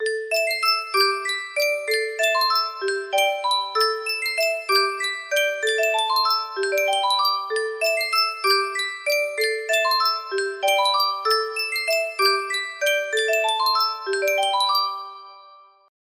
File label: Sankyo Music Box - Wizard's Waltz, music box melody